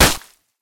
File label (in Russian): razozlivshijsya sopernik vipolnil otvetnij udar po licu